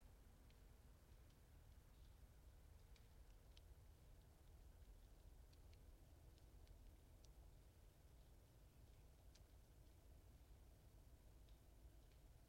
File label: yamanakako sound